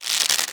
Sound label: archer aim bow